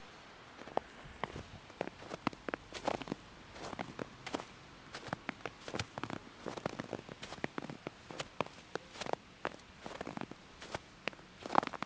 snowy walk

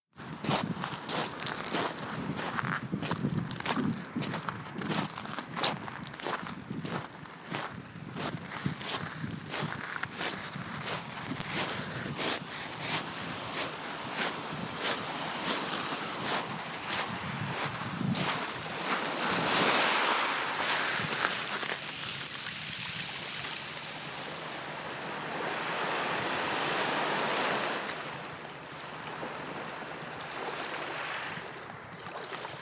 walking on pebbles and gentle waves

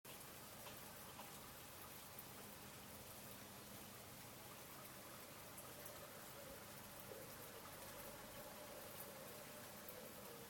wet westleton soundscape

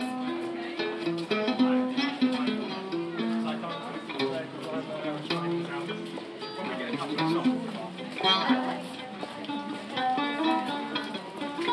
busker with a lute; brighton

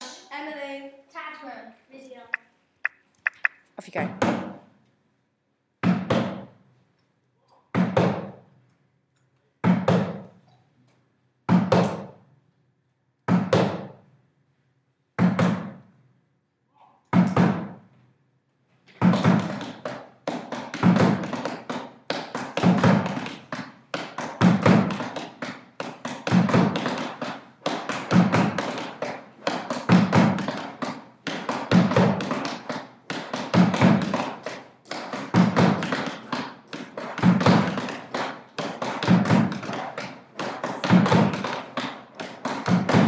percussion

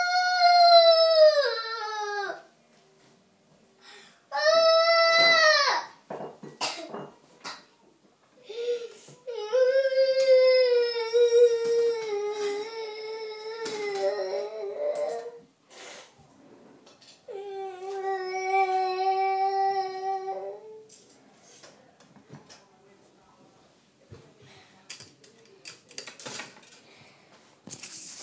making supper while m grizzles with tiredness

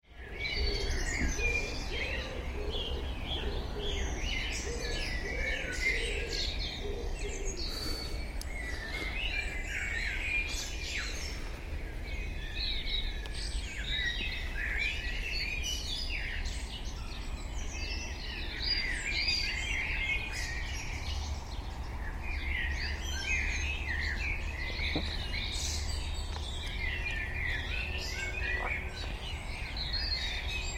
birds singing in early morning

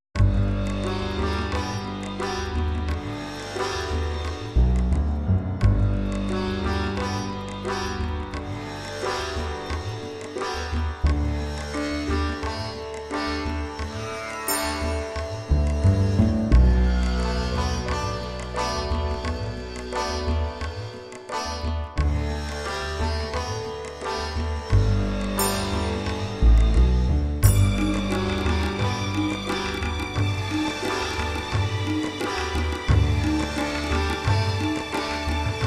backing track

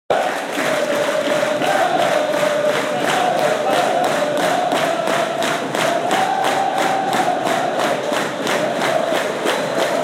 fans singing; pompey; at fratton park

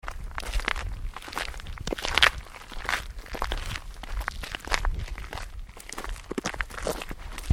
footsteps

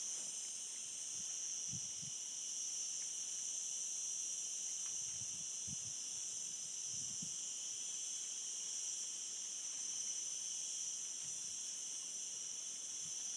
cicadas, sounds of summer

